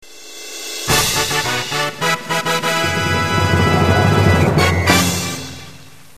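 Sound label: fanfare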